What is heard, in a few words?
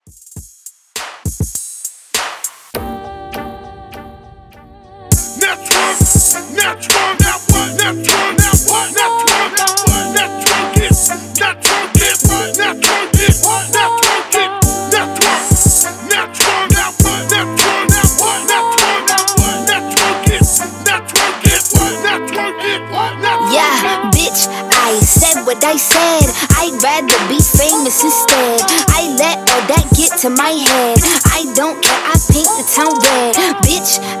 Hype Break In Outro 101 BPM